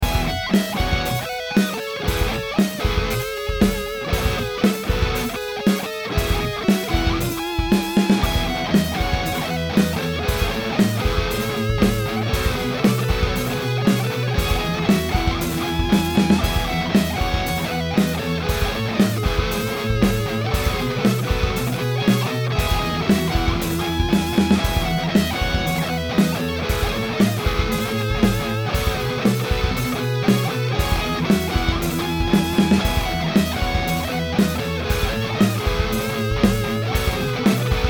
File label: Rockerverb 50 Lead 4x12 1960AV